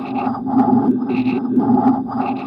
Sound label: loop, techno atmos